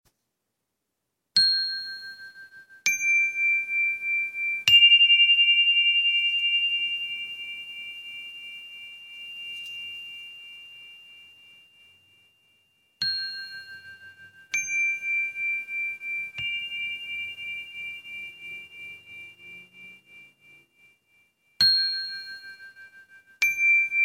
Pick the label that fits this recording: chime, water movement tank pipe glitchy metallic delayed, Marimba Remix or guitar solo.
chime